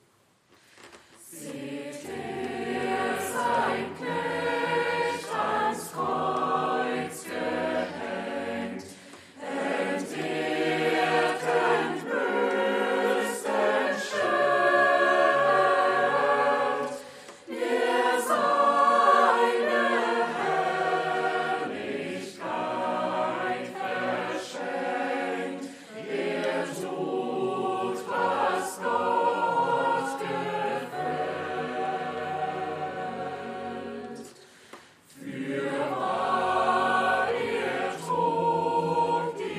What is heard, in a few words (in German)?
Chor; Seht her